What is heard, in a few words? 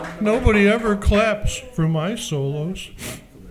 CLAPS